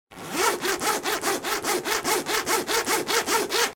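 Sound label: zipper fastener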